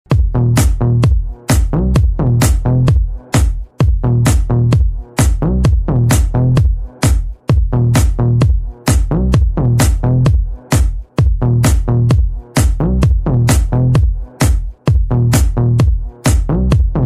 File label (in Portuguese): base exclusiva mega funk